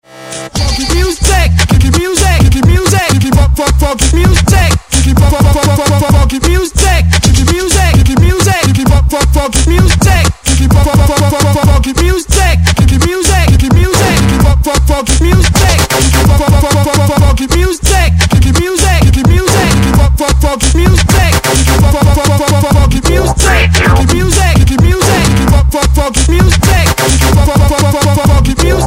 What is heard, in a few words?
Funk Music